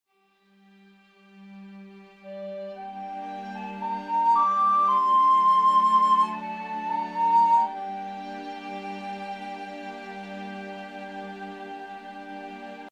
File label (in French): flute et orchestration